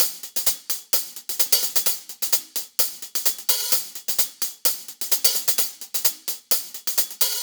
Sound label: 129BPM Hiphouse Kit